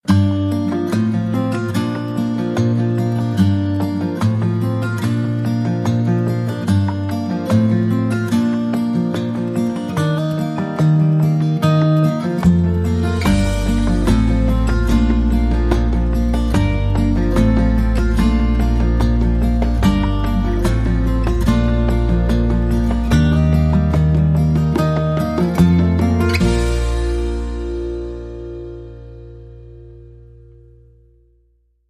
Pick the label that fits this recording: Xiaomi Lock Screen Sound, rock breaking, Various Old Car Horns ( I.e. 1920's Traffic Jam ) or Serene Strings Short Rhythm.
Serene Strings Short Rhythm